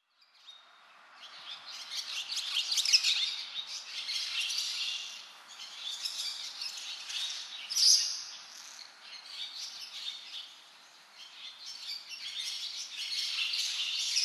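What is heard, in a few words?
barn swallow, kokubunji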